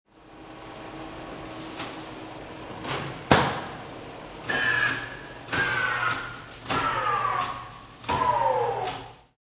Machine Turned Off, Industrial machine turned off and wind down; Industrial machine turned off and wind down in stages; Machinery Sound Effects; Lynne Music